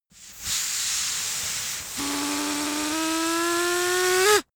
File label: balloon air release buzz